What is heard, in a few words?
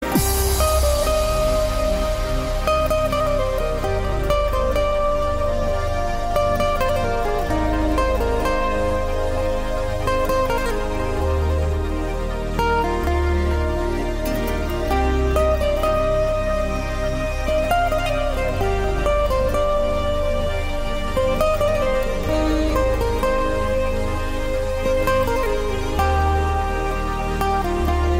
Romantic